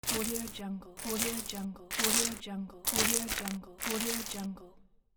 footsteps in a swamp

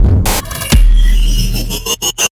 bloody nightmare hard stutter impacts; soundmorph

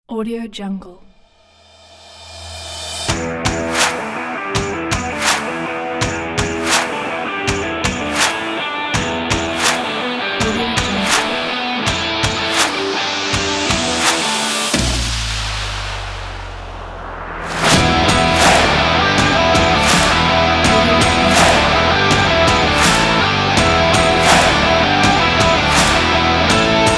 powerful stomp rock; stockjam

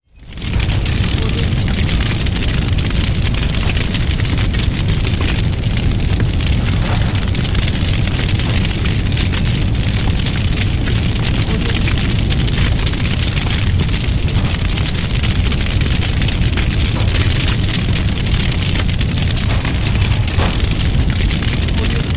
building fire; chicasound

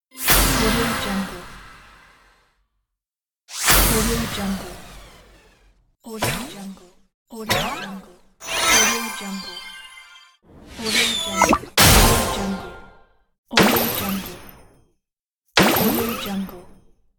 game booster pack

